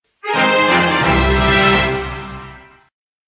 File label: level up